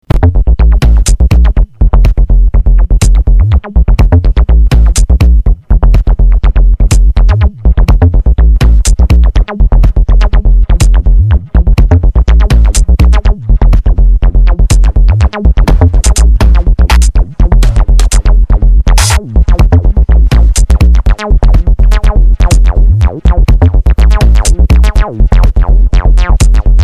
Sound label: Bassline, DDM-110; Glum-C; 2x Bassline